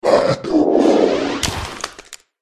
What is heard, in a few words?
orc skeleton death